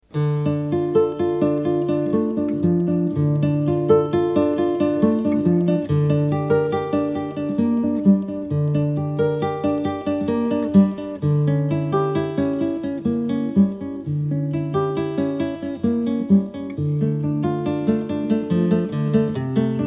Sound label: Prelude in d minor